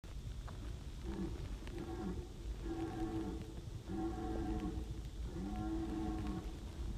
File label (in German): Roehrender Hirsch; Wildtierkorridor; Gruenes Wegenetz